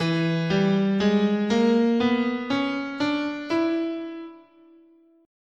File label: E Hungarian Major, scale